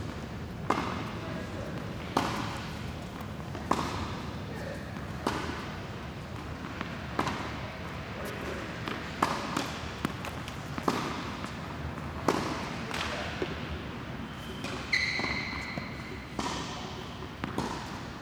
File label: tennis indoor court ambience